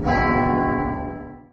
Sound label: hellish impact